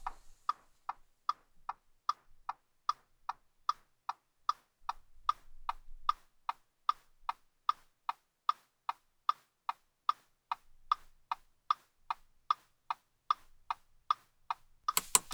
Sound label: Audi A4 B8 2.0 TDI Foley Interior Emergency Lights Off; Mono; SoundHolder; Audi A4 B8 2.0 Diesel Library